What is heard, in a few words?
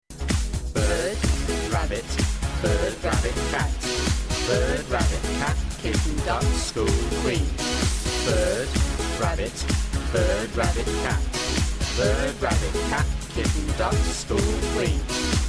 Keyword Rap; THRASS